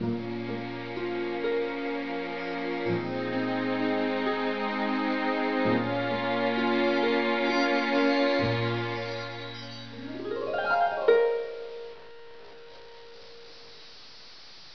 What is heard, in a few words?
Waltz loop intro; the hat chooses the wizard